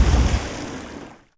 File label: Destroyer Run End; Civ4 warlords